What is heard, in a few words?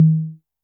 korg trident